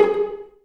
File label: VLN PIZ; AKAI S-Series CD-ROM Sound Library VOL-2